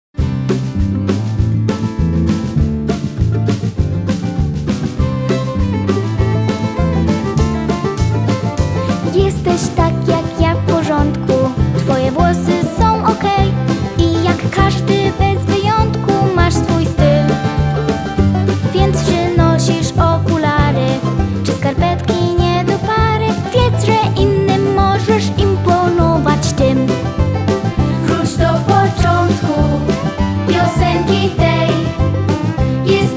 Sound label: OKEJ song; szkola na tak